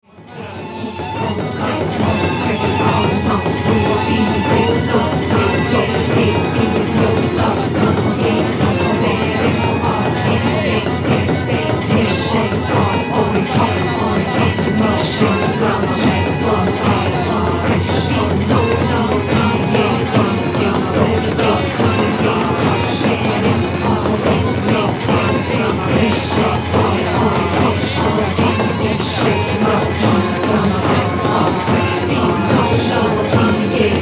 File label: Loud Tribal Rock